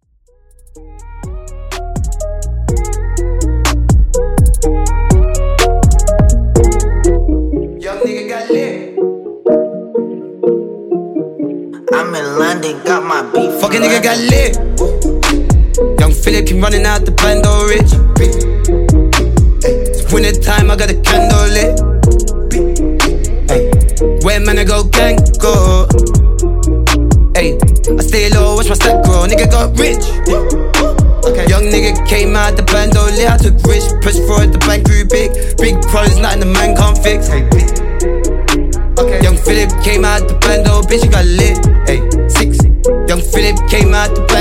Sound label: Hiphop